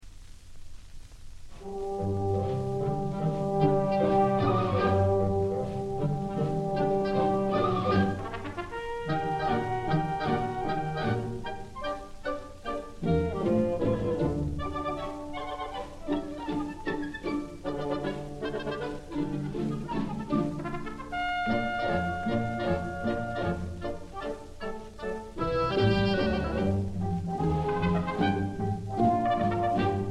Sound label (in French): trompette; bizet; jeux d enfants op22